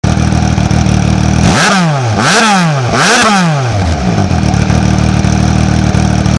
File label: caferacer 1130, benelli